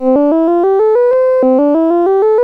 Simple Scale, sid, CSG8580R5

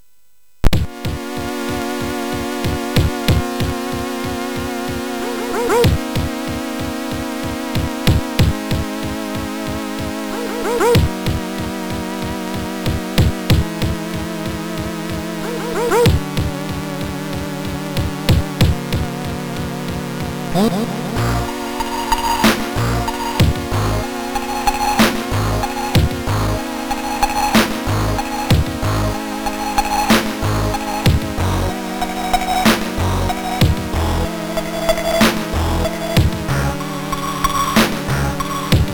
Digi Music sid MOS6581R3; hvsc

